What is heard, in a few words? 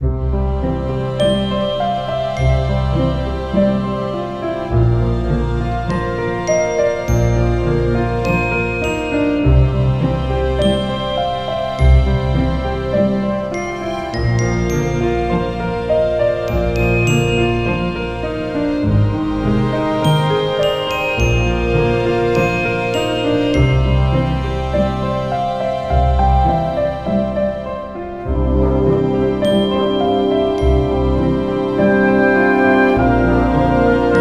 Guild Menu; Gran Saga